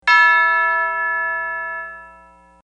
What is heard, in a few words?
end turn